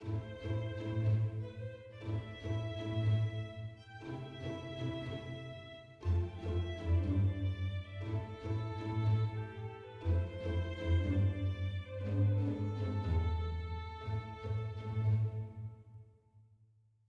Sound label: heroic soft